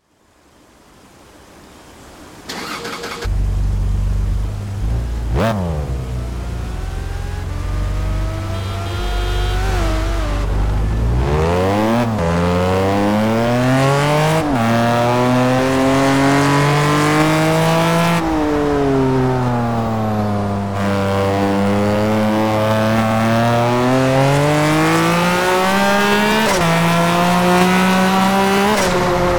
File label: acura nsx; Acura Szero